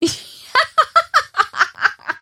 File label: mirana laugh; dota